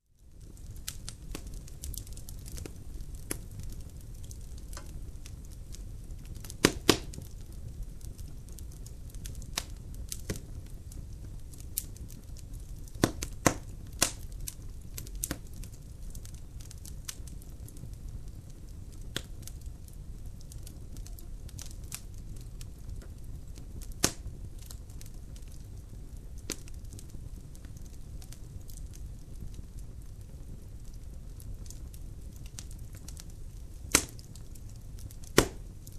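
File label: fireplace sounds; zvuki kamina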